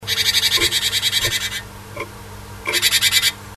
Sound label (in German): meise schimpfen; nistkasten